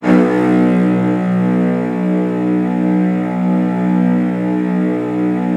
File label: CELLOS C#2, Strings; ILIO; Synclavier Strings